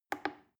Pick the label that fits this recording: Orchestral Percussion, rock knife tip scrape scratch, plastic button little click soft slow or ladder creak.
plastic button little click soft slow